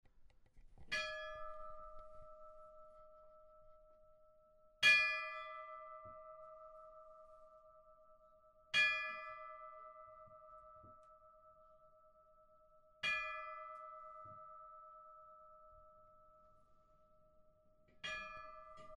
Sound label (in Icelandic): hjardarholtskirkja likhringing